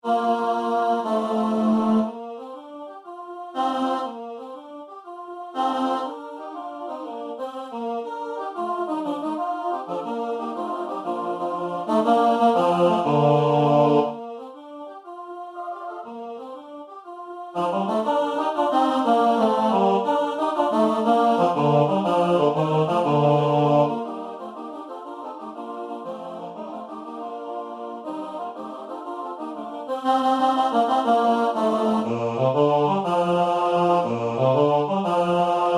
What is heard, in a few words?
Bass